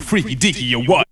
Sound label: VOX